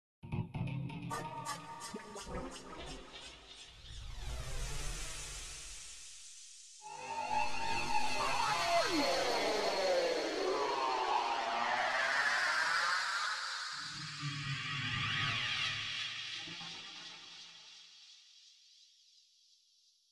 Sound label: Transition FX; Multitrack; Stem Kit